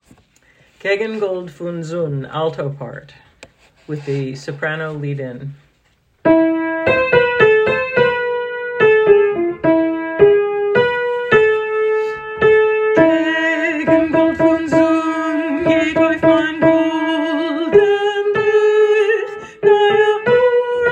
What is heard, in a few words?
Kegn Gold Fun Zun alto part